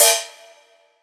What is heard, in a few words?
Hihat; Ghosthack Advent Calendar Day 4